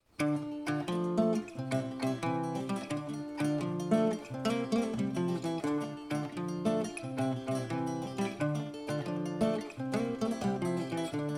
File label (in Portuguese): instrumental viola caipira